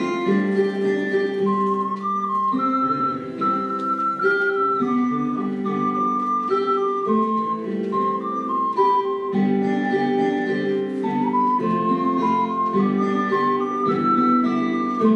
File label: ocarina; gakki